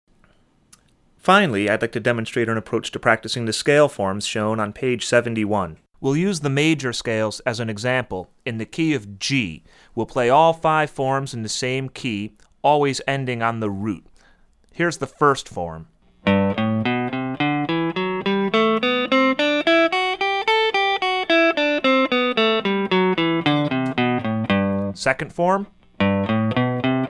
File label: Major Scales